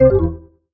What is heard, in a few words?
error, Nfc; android 10.0.0